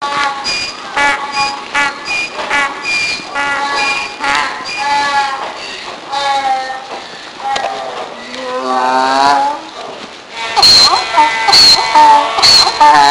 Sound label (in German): esel